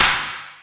Clap Drum

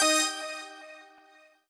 normal hit normal

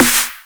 drum hit clap; osu

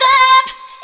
vocal

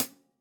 soft hit normal

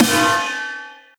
skull snare

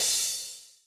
soft hit finish; osu

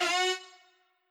sax

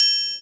Chm Bell M; osu

